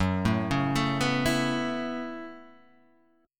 F sharp Minor 11th

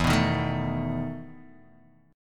E Major 7th Suspended 4th, Guitar, Open D

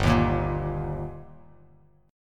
G Minor Sharp 5th G Bass Standard, chord; strummed; JGuitar

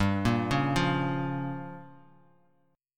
G Minor 7th Flat 5th, Bass Standard; Gm7b5 arpeggiated; JGuitar

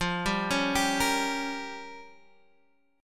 G Minor 7th Flat 5th

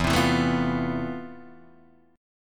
F Major Sharp 11th E

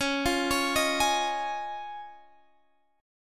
C sharp Major Add 9th, Guitar, Standard; C#add9 arpeggiated; JGuitar

